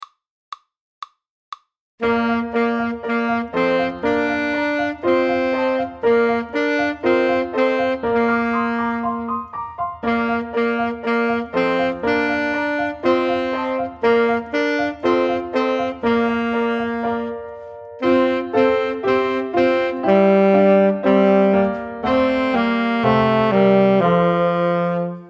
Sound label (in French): auclair sax mib